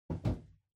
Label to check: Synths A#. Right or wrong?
Wrong